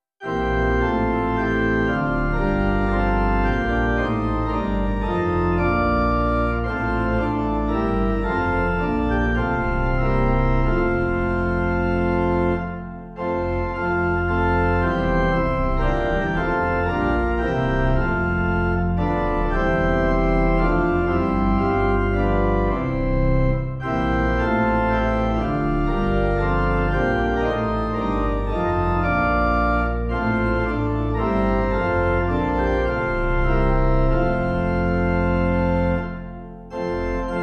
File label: organ 4vs; richmond; Hymnal 1982